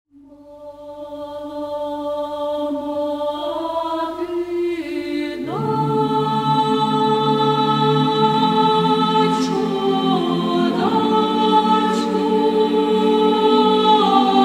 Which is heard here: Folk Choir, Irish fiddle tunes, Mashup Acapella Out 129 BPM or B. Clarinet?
Folk Choir